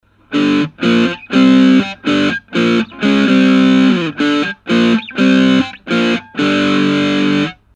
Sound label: rhythm; tweako